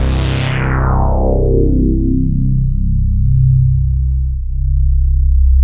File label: MKS BASS